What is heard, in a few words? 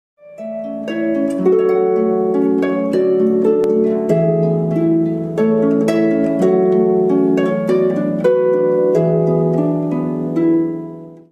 Harp Solo